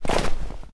snow footstep

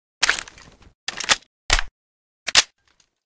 grach unload, weapon

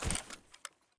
grass footstep

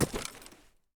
gravel footstep

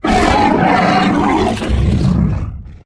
krevetka alive; mob

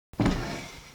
wooden Crate Open

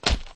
guardian walk

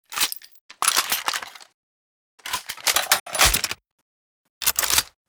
dp reload, weapon; dp28

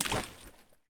water footstep

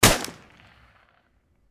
rpd shoot, weapon; metro

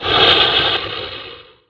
krevetka damage; mob

